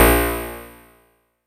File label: class menu, music; tf; tron mod